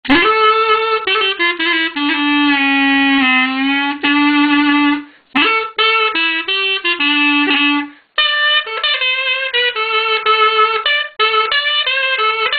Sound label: drcek, bagpipes